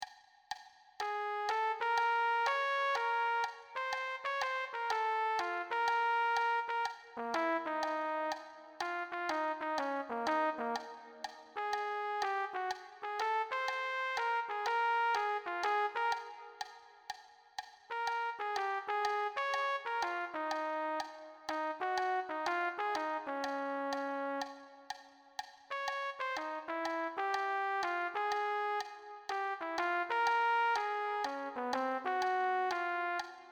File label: jazz trumpet swing